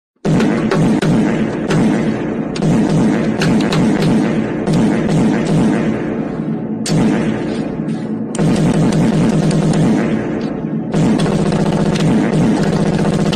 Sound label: fart guns